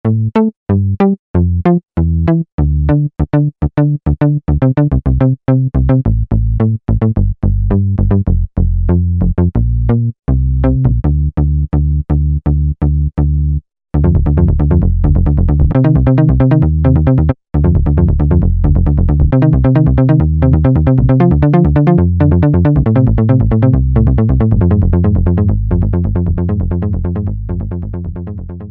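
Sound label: JP-4 Bass; Roland SRX-07 Ultimate Keys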